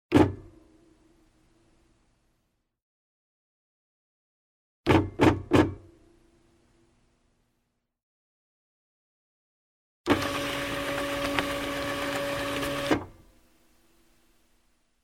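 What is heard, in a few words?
printer feeding 3 lines page feed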